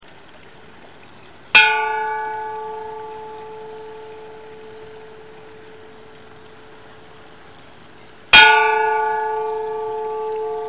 종

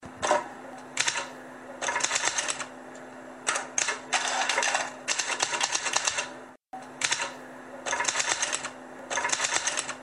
타자기

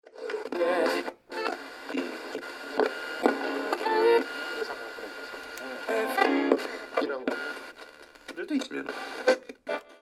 옛날라디오

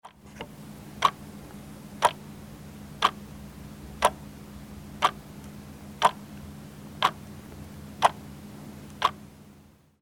시계

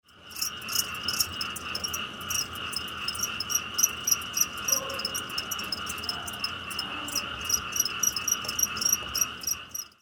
개구리

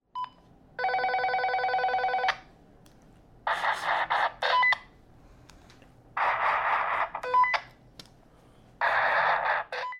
무전기

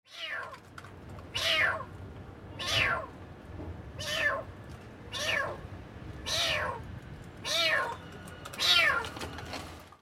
고양이

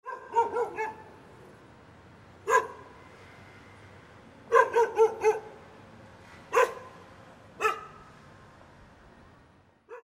개

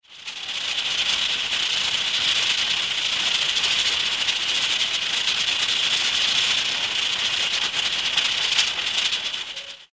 전기밥솥

